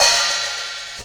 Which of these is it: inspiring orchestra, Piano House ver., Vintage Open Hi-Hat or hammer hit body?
Vintage Open Hi-Hat